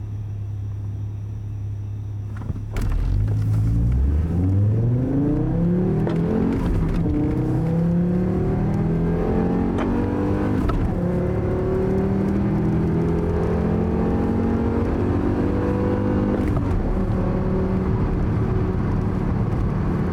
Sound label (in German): 20V Sound innen zu, sauger; tuning